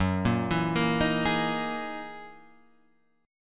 Gb 13th Gb down Guitar Half Step Down

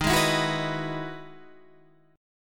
E Major 9th D sharp, chord

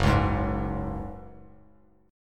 F sharp Suspended 2nd, Bass Standard; strummed; JGuitar

